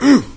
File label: zombie pain; doom3